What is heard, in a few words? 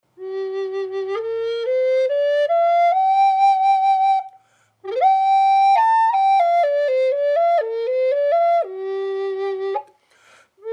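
G minor Port Orford Dry, cedar flutes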